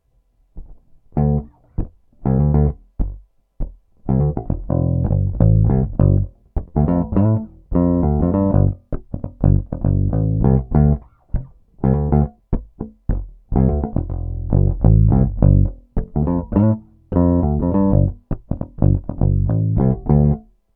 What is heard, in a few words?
Pick Tone DOWN, peavey; peavey shootout audio samples